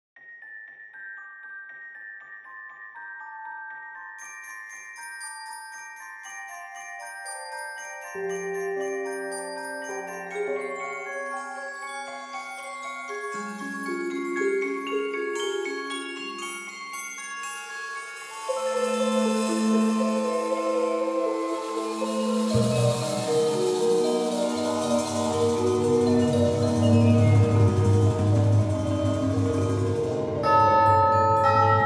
Complete Percussion

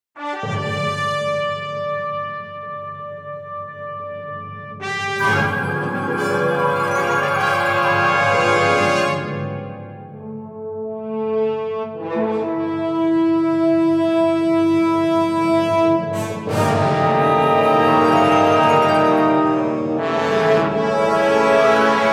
Complete winds

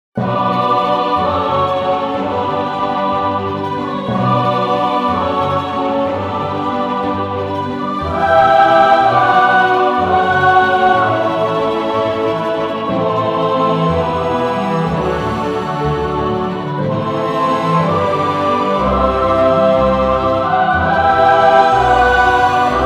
lyric epic

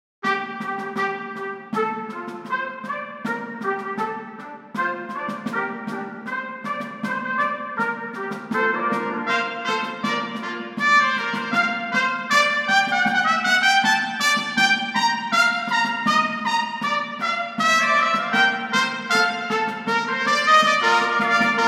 The Brass Soldier

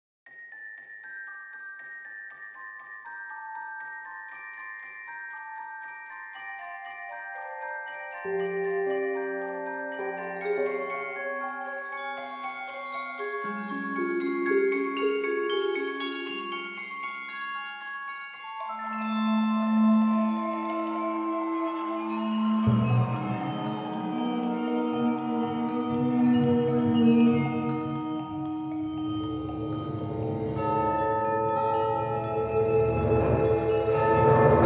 Percussion

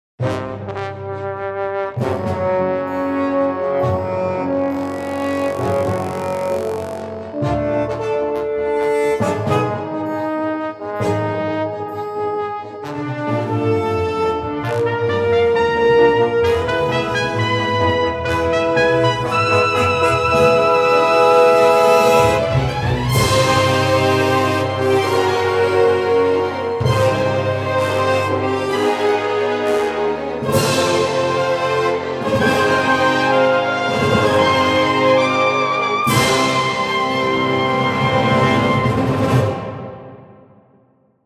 Brass

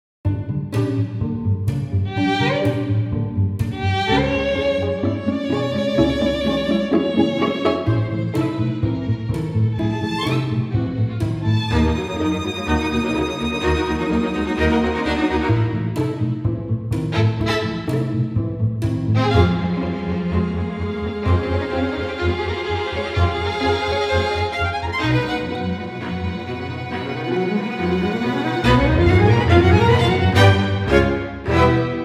chamber solo

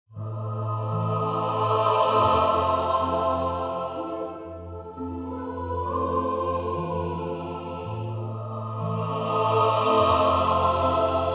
choir

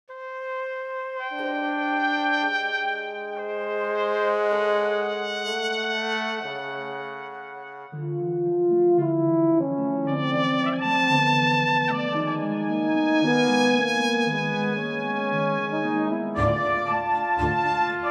Special Brass

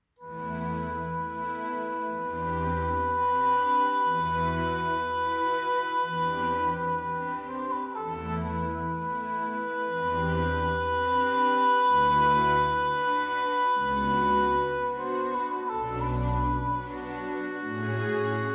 oboe d'amore

